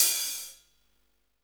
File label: HAT, Hi-Hats, HH Modules; Roland L-CD701